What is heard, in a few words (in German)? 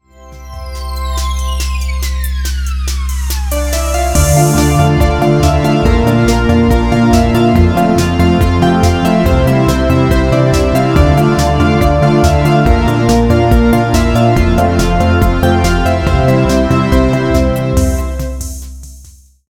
schlager